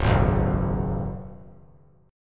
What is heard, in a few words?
Db 7th Suspended 4th Db down; JGuitar